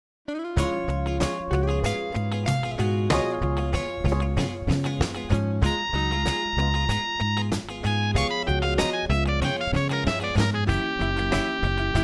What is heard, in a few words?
Db7 Coltrane Diminished Dominant Pattern